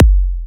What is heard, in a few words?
Trance Kicks